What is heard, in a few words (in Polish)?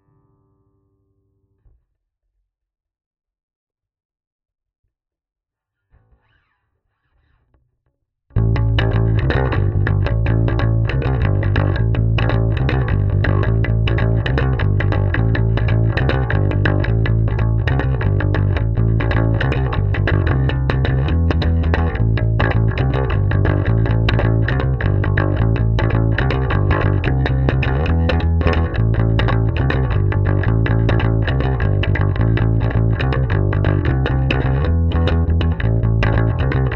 bass